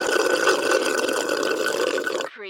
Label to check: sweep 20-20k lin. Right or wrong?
Wrong